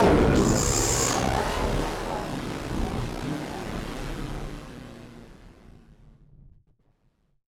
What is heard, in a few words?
Malfunction Synth, malfunctioning synth samples